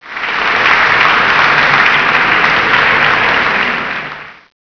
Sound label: APPLAUSE